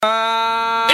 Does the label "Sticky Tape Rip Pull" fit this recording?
No